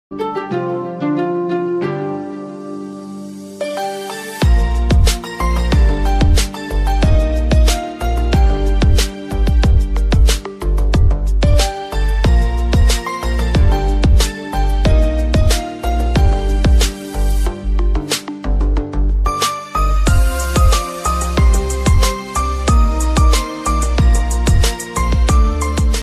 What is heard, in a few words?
piano ringtone